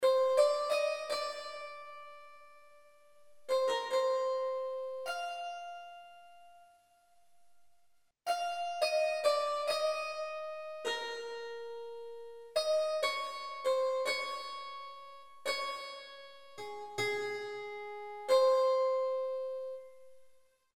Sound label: Melody; QFG1